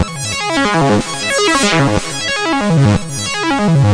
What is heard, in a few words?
hard arpeggios down; SYNTH AND ELECTRONIC LOOPS